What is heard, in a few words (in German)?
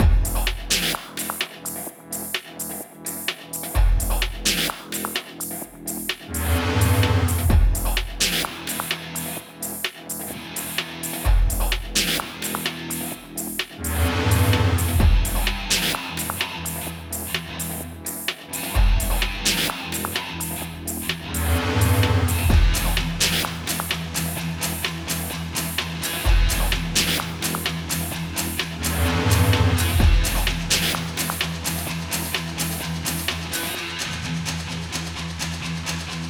Gitarre industrial